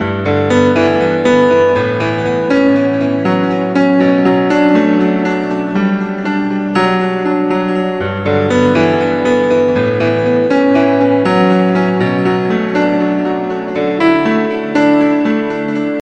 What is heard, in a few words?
Sound of a piano